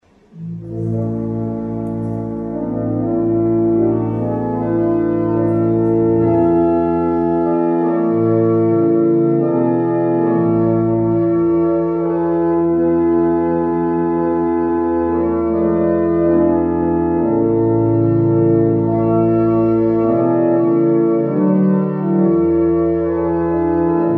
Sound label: Chant, barrel organs; Barnston; Goodenough